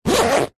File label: foley luggage bag fabric small zipper short; zapsplat